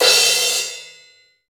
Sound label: Crash Stop Long, Cymbals; Roland BK-5